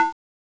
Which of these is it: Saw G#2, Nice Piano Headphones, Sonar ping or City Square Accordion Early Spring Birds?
Saw G#2